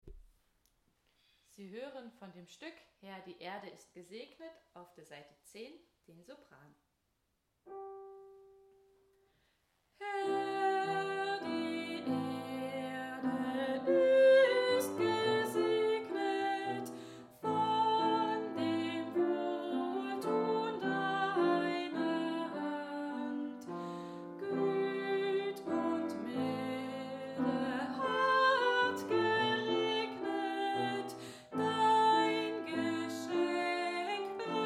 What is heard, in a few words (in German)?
Sopran; Chorfest der EKM auf der BUGA; Herr die Erde